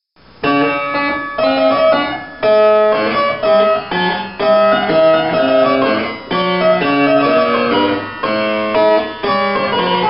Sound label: Trumpet tune in A